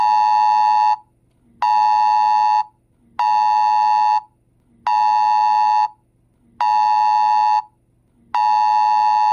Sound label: ndrrmc alert